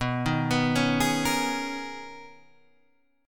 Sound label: B Major 13th B, Guitar, Standard; arpeggiated; 7,6,9,6,9,6; JGuitar